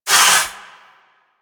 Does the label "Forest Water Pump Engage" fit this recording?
Yes